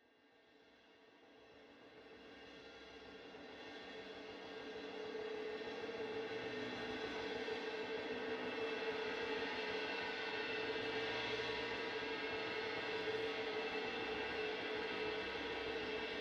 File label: Sound Performance; Phonophon